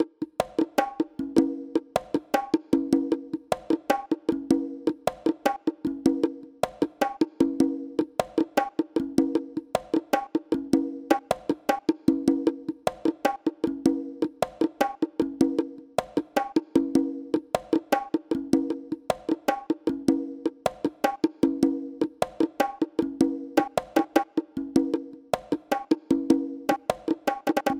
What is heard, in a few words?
Percussion Base; Newer Super Mario Bros Wii; World 0